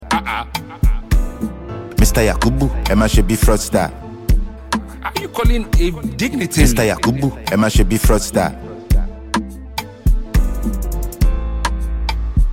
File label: Instrumental